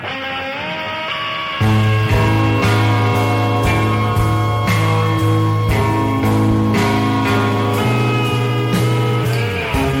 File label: meme music; sisyphus